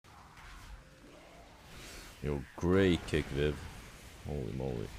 confete

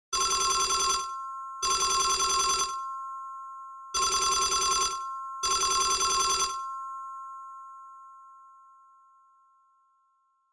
telefone tocando; gta

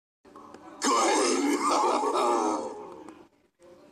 queime hahaha

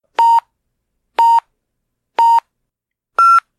sinal gaucha

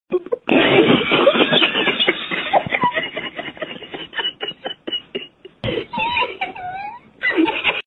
rindo; lesmas; equipe rocket

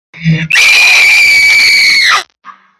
grito

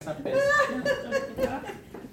toque para rir

